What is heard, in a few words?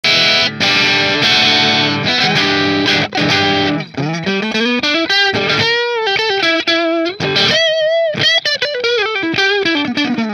Nola Voodoo dark red hum neck split marshall; New Orleans Guitars Voodoo Custom Redwood Top